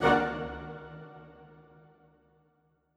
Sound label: Woodwind Ensemble Hit